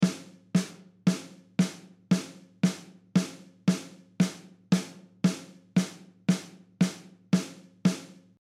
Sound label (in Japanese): トレーニング用・表拍感じる